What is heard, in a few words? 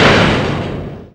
BANG; AMG - Vince Clarke Lucky Bastard